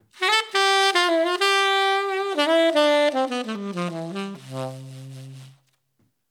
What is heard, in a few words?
Lazy Afternoon 12beat Dmaj 110